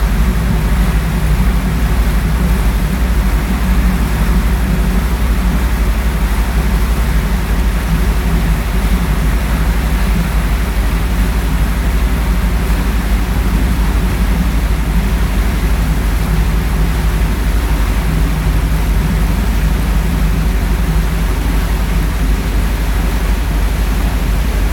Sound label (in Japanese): fune